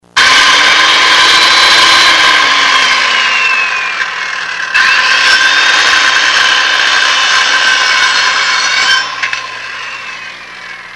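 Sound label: electric saw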